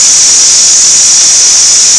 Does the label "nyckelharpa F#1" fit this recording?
No